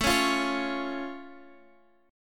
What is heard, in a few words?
A Augmented A down